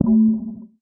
One Shot, Hits

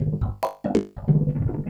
Industrial 140

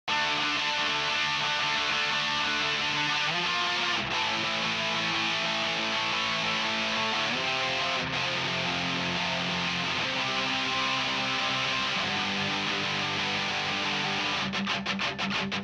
Overdrive Rhythm

